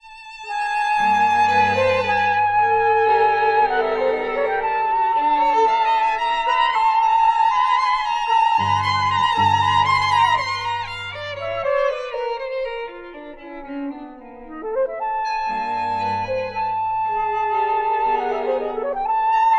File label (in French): Trio pour flute violon violoncelle; op 31 no 1; mvt 1